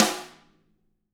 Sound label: DW RIM, SNR; ILIO; Double Platinum Drums 1